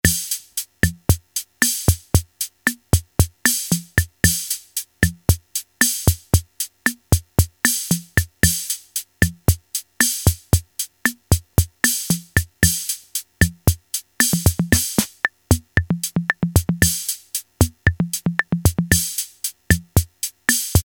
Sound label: bossanova, Casio MT-68 rhythm